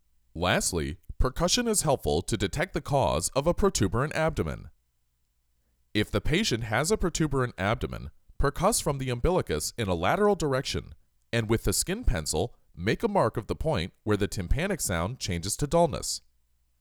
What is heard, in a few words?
Percussion; Ab Percussion